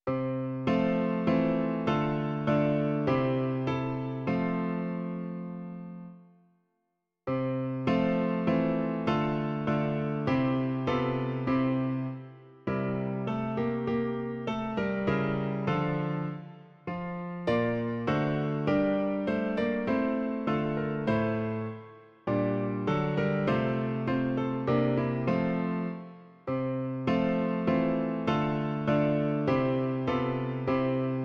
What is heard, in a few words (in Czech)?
Kdo hledá sobě přítele - klavír; Klavírní verze